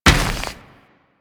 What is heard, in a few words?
Moa Combined Step Dirt, footsteps; Enemies; Warframe